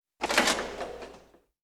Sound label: stadium turnstiles